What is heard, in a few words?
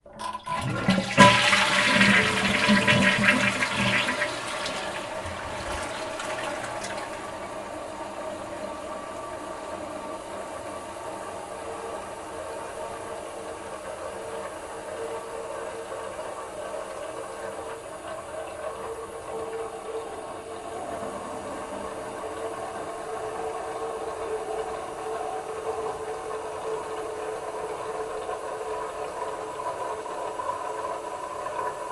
old stuff toilet tank pull chain; sonniss gdc